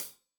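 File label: hat closed, hi-hat cymbals stereo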